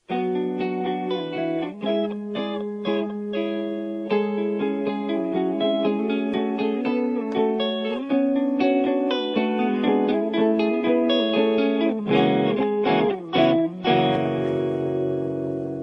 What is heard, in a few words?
Guitar Riff